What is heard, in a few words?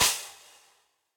Crash, Cymbal; Drum Hits; Standard Hits; Crash FX18o16 Cop Style; Session Drums Studio